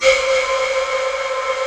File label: BLOWER, Peavey DPM; Rubber Chicken Software Sample Bank